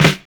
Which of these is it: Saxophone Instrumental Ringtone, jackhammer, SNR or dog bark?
SNR